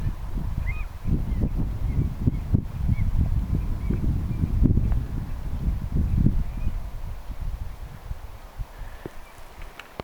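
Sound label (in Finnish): siellä on pienellä sisälahdella ilmeisesti luhtakana vai onko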